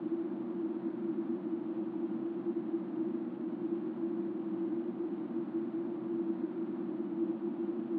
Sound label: noise; ゆめ2っき